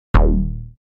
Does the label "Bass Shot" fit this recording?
Yes